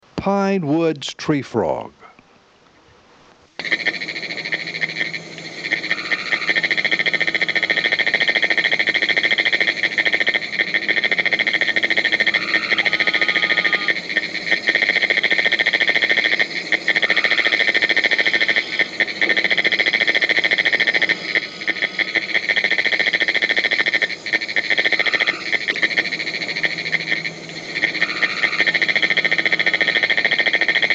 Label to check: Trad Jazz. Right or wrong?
Wrong